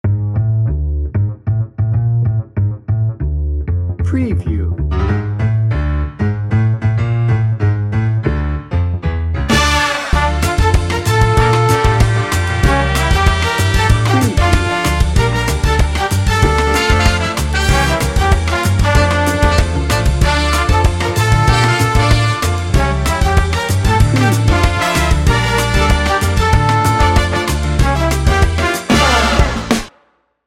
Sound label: miami holiday 30 second